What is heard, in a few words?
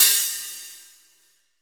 HH, GM DRUM SET; AKAI S-Series CD-ROM Sound Library VOL-8